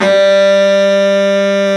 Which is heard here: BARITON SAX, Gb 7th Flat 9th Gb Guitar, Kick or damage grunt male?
BARITON SAX